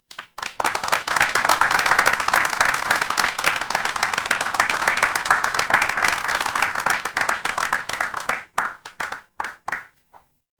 crowd applause

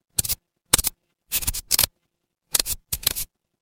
office pen

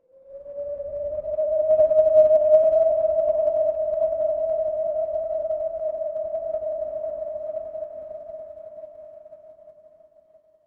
ambience wind

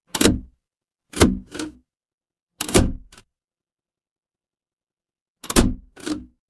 mechanical lever

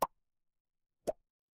cartoon pop

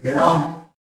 feedback level up

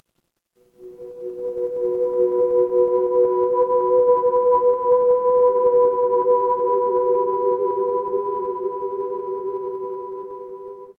horror ambience dark

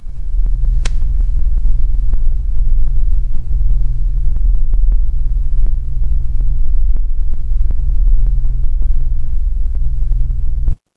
ambience room tone

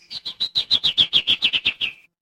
animal bird

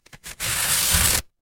paper fabric tear

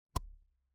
ui pop

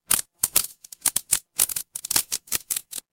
fire electric crackle

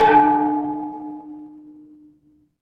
instrument gong

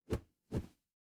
paper fabric cloth whip

